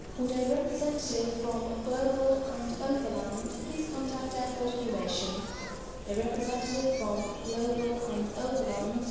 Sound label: Airport Terminal Building Footst